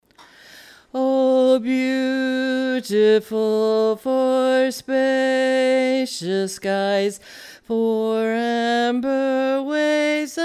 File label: bass alone, chorus